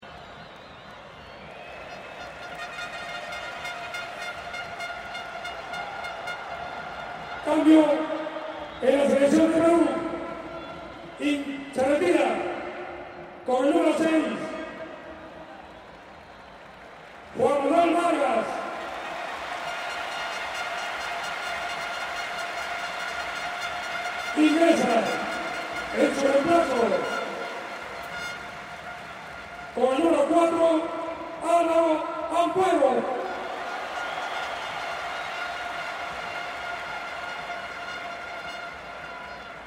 PARTIDO DE FÚTBOL PERÚ VS ECUADOR CAMBIO DE JUGADOR PERUANO SEGUNDO TIEMPO; deporte; Gente

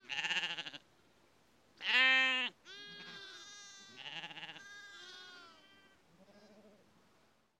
OVEJAS EN CORRAL ALTIPLANO; animal; animales; campo; pastor

